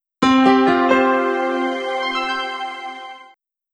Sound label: New Town Unlocked; Buildalot